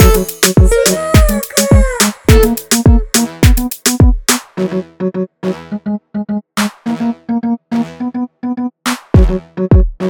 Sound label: Ugandan Afro Dancehall Riddim Beat; Snuk Beats; Binyuma Recs